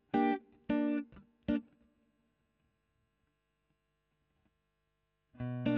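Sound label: Electric guitar Royer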